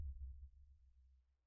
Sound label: sonar Tail Air Close Beacon Directional; Barotrauma; Real Sonar